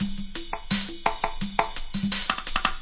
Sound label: 170 bpm relaxed conga n rides